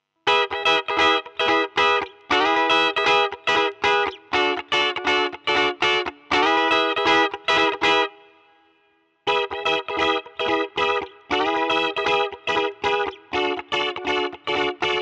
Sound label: Guitar Phrase Vibrato